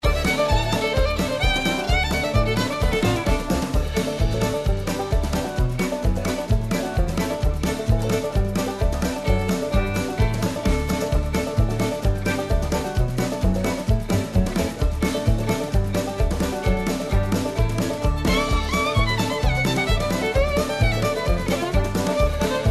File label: Barn Song; My Little Pony